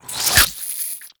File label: Infested Burst Rifle Reload, weapons